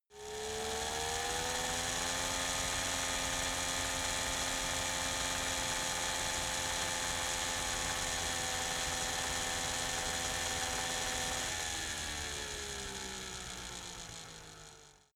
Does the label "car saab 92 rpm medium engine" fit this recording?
Yes